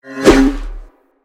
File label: Sword; Lego; Strombie; creatures organic